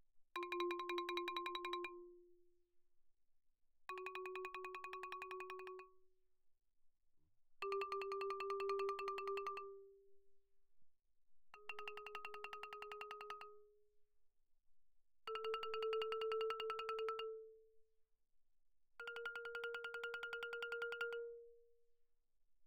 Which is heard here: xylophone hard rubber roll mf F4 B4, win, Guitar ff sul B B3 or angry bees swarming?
xylophone hard rubber roll mf F4 B4